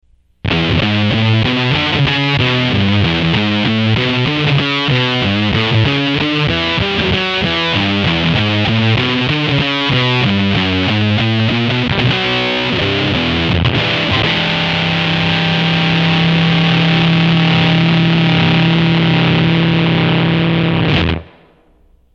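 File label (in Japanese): smooth fuzz, Smooth fuzz 「ムームー」と唸るようなファズサウンド。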